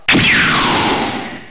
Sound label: wi beam; svencoop; ub megaman